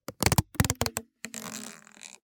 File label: Balloon Squeak Creak; Creaks n Squeaks; soundbits